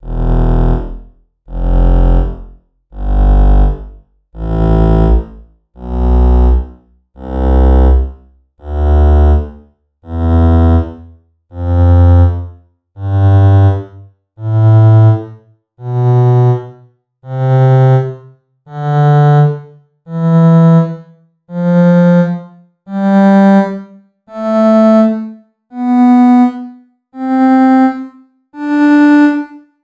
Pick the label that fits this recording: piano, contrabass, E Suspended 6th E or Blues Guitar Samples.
contrabass